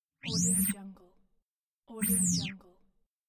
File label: television on and off signal